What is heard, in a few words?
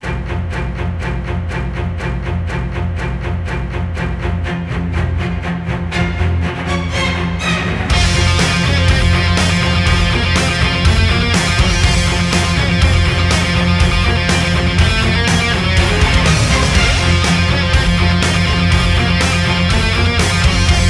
instrumental